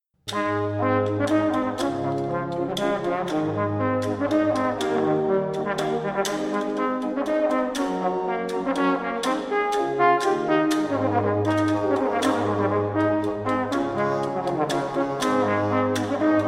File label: Recercada Segunda Passamezzo; Ortiz